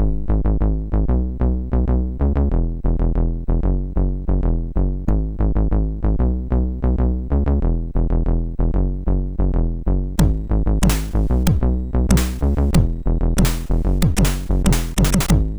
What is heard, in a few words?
Intro, sid MOS6581R2